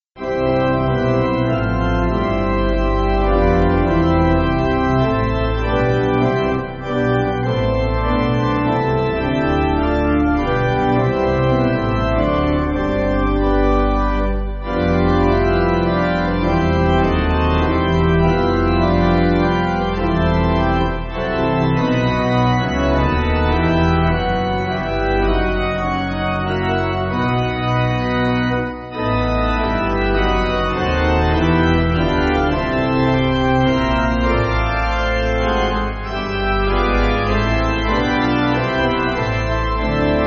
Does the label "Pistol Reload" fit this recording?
No